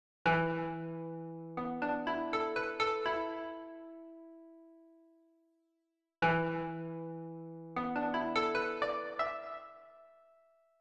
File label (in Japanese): harunoumi koto